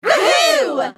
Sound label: Wild crowd cheering